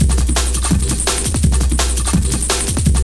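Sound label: drum loop; X-Static Goldmine 3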